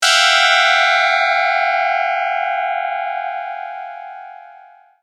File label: Scary; Halloween